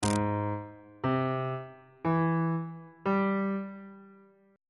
g sharp augmented major 7th chord intervals

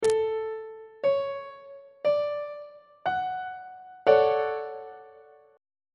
d major 7th 2nd inversion on treble clef

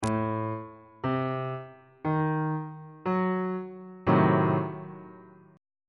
d sharp diminished 7th 2nd inversion on bass clef

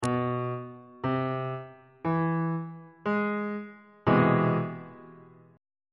c augmented major 7th 3rd inversion on bass clef

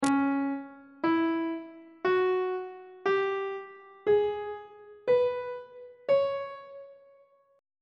c sharp blues scale on treble clef

